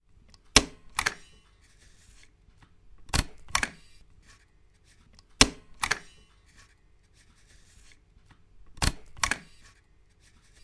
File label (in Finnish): niittari